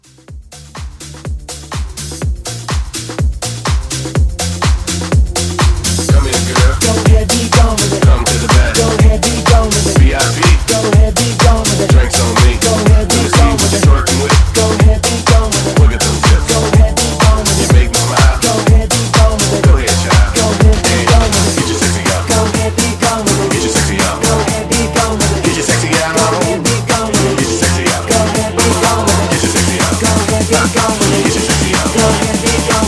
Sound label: House Mashup Blend